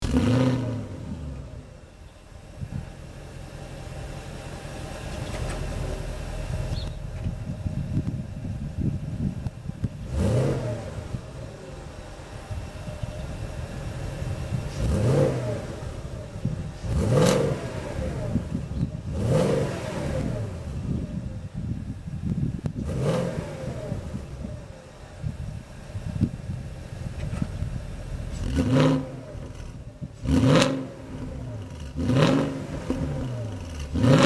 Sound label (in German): Porsche Cayenne GTS Klappenanlage